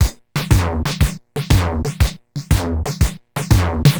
Distorted TR 505 (120BPM), beats; musicradar; cyborg beats

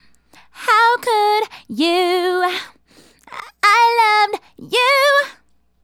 Female Solo, Hip-Hop and RnB Vocals

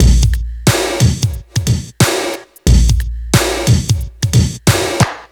Club Tip, R&B Drums

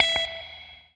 ROCK, Guitar

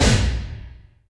CINEMATIC, FILM PERC; Drums In Your FACE!

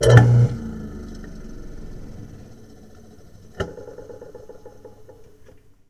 DISPOSAL, Kitchen Counter; E-MU Producer Series Vol. 2; More Studio Essentials

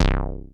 Analogue hits and octaves; FM 57 - The Ultimate Sample Collection (1997)

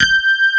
HARD RHODES; Pro-Rec - Synthsonic

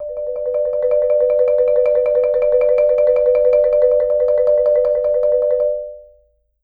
D4, Marimba roll; Sampleheads - Dave Samuels Marimba & Vibes

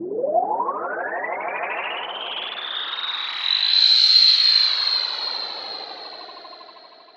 NRG SFX, Trance

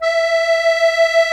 Musete, Accordians, Roland; Club-50 - Foundations

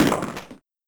Simmons Clap; Drums

